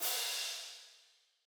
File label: Crash